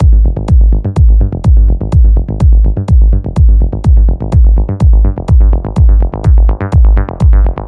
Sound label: Synth Loop, Abm, 125bpm